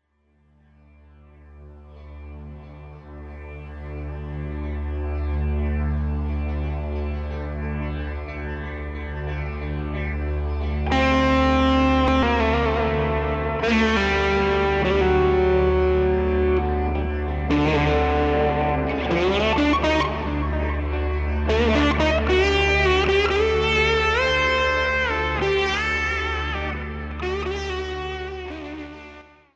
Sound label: pulse